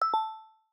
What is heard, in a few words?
state change confirm down; Signal Desktop